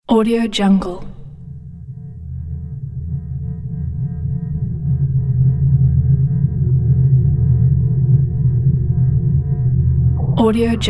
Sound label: scifi drone ambience